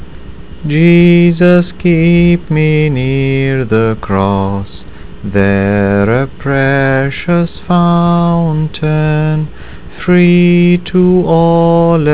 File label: Hymn; Keep Me Near The Cross